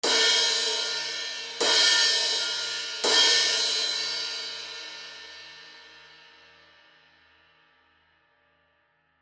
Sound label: zildjian heavy crash hits